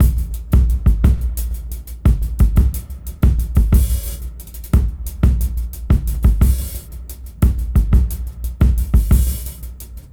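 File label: Fat Break 89 BPM Reverse; AMG - Keith LeBlanc Phat Butt Jams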